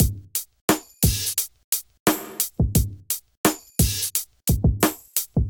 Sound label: MPE Kit, Hip-Hop Drums; Golden Era Hip-Hop Drums by Sound Oracle; Wild Emerald Kit